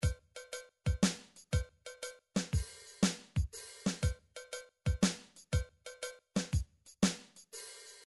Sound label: midi drum patterns drums soul